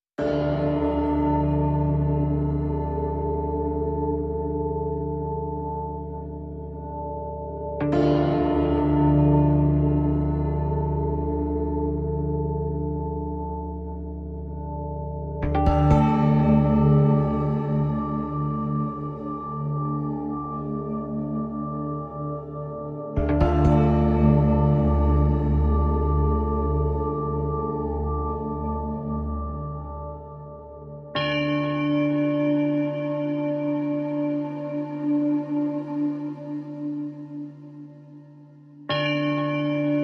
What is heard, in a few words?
Bells and Pads